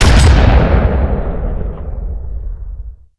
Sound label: jack fire